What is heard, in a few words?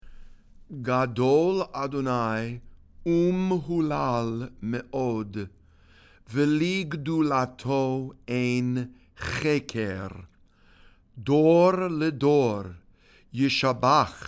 ashrei read and sung